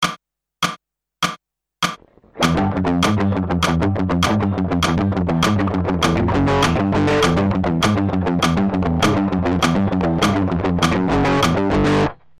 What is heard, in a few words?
palm muting